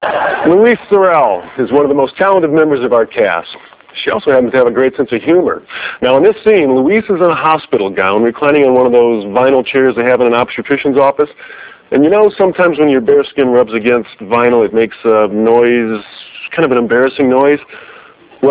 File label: fart